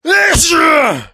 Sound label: male sneeze; human